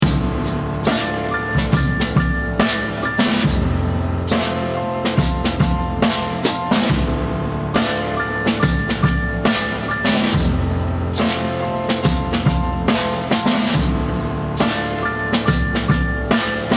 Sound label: comp eq